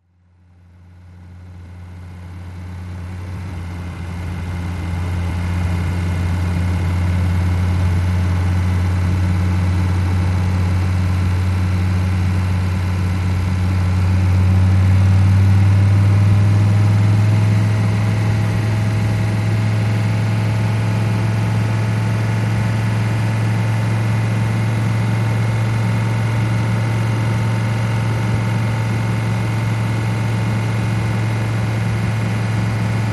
Driving At 25 35 Mph, sports car

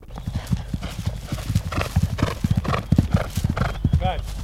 Horse Gallop Breathi

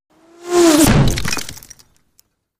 Bullet Impacts Hitti, stone; Bullet Impacts Hitting Masonry With Whir In And Debris Ver 8

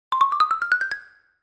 Xylophone Come Upsta

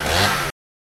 Chainsaw Rev Up Once; chainsaws

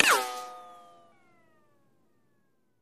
Bullet Ricochet Sin

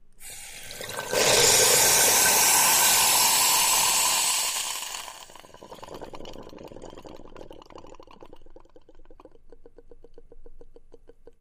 Water Hiss Intense, steam

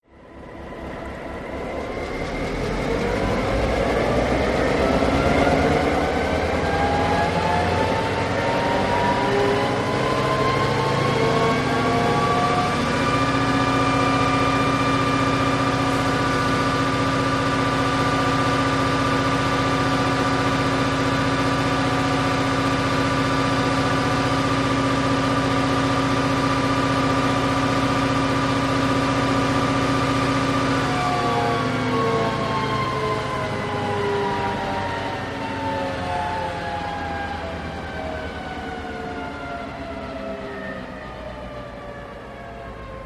Train Rev Up Down, diesel

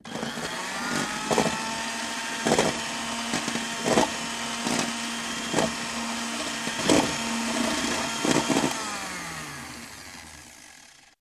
Mixer Hand Held Elec, appliances

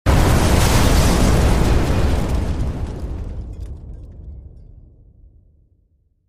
Explosion Debris, metal & glass

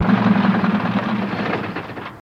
Truck Diesel Off

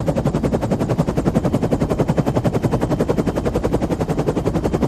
Heli Blades Loop

